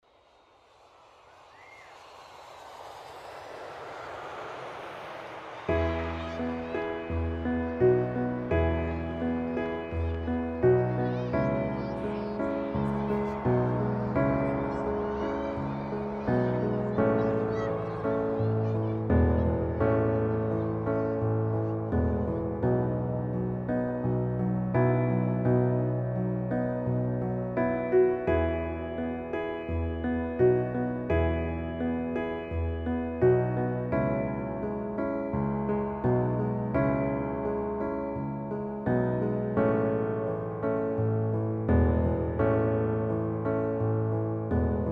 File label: piano compo